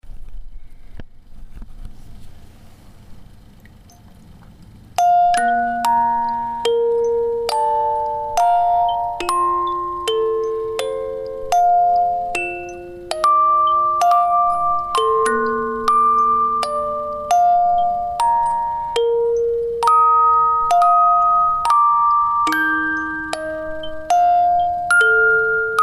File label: musicbox